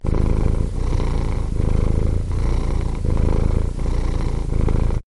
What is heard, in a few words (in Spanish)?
ronroneo gato; podcastelalexis